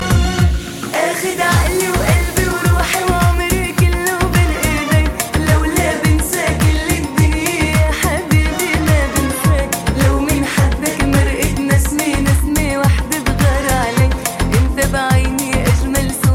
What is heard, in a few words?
Arabic, ringtones